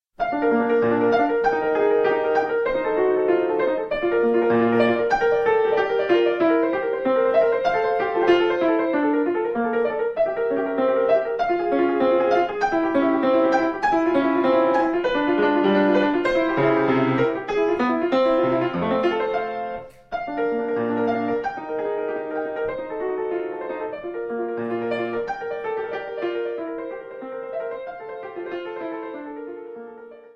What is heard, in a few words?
bach Partita Gigue